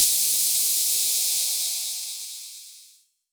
Crash, Cymbals

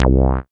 SYN ANALOG; Sound & Vision - Gigapack II

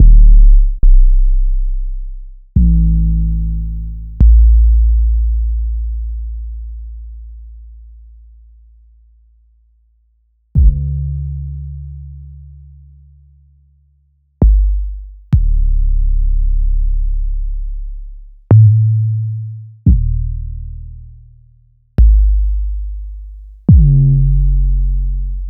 808 trap 808s; Sliced

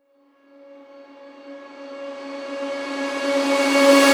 Reverse Synths C#, Effects; Vengeance - Essential Clubsounds Vol.4

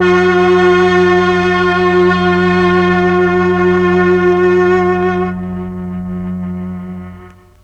MEL STR, Legendary M400; ILIO; Propeller Island Legendary M400

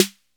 DRM 808 SN, Drum Machine; Roland L-CD701; Cheese menus

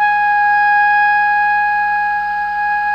SOP PP G#4, Saxophones; Greytsounds; Philip Wolfe David Crozier's Saxophones

